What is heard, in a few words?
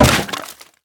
wood plank break; physics